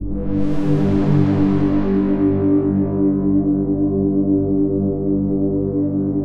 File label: Pad G2; Nucleus Soundlab; OB Resurrection; Physix Pad 1